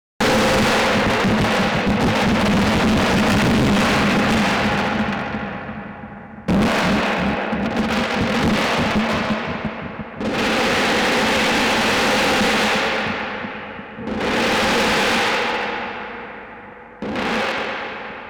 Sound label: music catastrophone